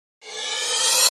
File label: Rev Cym 120 C; Beach Day